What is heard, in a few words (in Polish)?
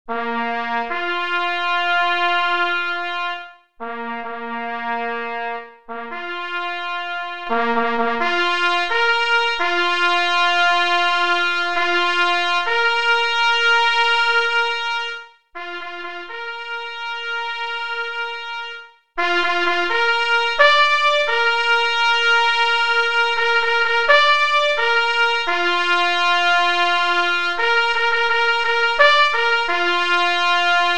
Hejnal parf; K.J. Szpetkowski; Hejnal Malopolskiego Konkursu Sygnalistow Mysliwskich O Rog Zbramira; parfors solo; Niepolomicach